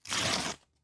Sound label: Paper Ripping